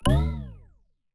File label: Retro Jump Simple; Bounce Jump